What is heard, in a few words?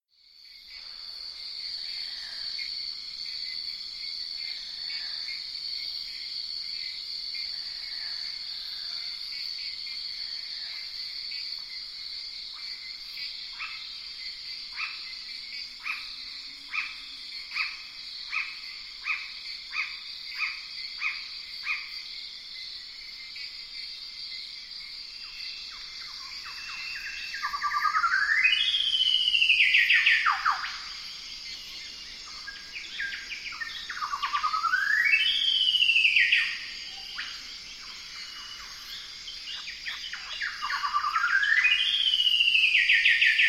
golden whistler bird singing; soundscapes